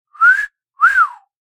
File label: Wolf whistle whistling at an attractive person catcalling